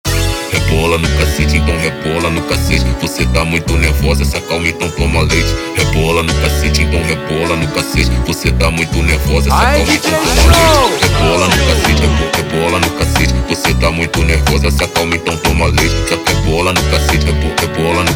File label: Brega Do Jazz slowed, funk